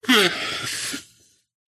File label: leisure whoopee cushion blow up inflate